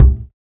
deep bass drum sample c key, bass drum one shot tuned to the C note